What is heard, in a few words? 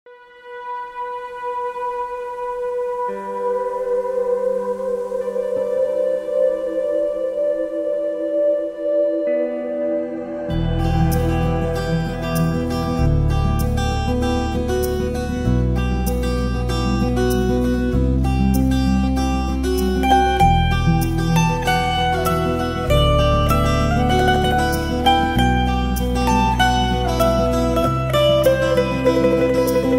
Love tone